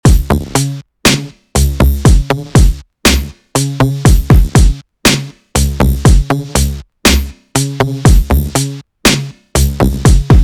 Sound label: waves 120bpm